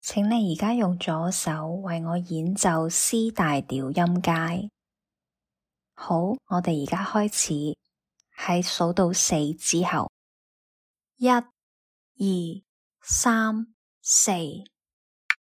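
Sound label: C major scale left hand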